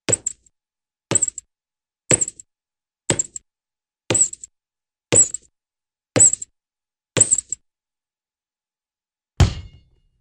Rubber Ball Bounces Slowly - Single Basketball Bounce, cartoon; Hollywood Edge; Cartoon Trax